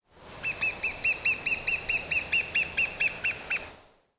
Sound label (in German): Wendehals Ruf